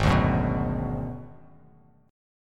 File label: Ab Minor 7th Flat 5th, Bass Standard; strummed; JGuitar